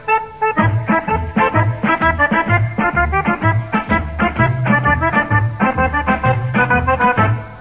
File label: tarantella napoletana